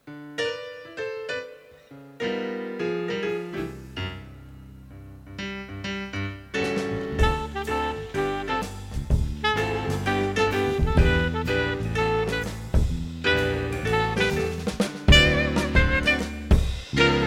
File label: jazz; maltajazz